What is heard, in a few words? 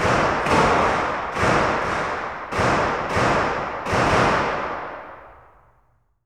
gunshots 6 echos